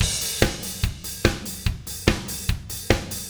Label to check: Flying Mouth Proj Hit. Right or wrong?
Wrong